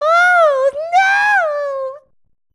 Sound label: fat death; buu